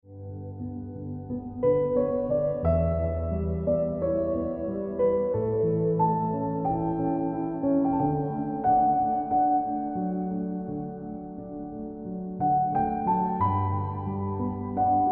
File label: Ringtone Piano Music